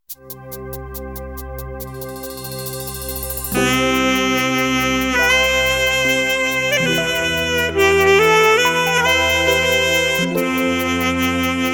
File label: Cafe Music Saxophone